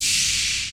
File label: Magic Circle In; battle item